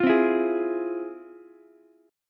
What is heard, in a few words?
F Minor Major 7th, Ukulele, chord; FmM7 strummed; JGuitar